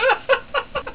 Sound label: guffaw